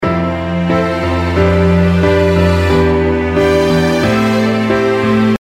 strings

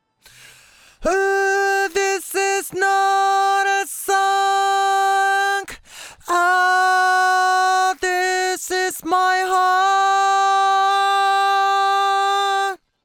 Vocorder

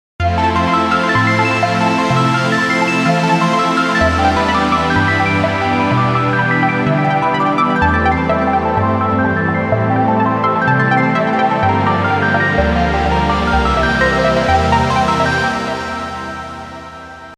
Arpeggio

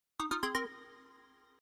Synth

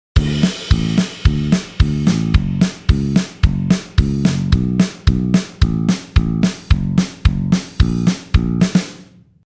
Bass

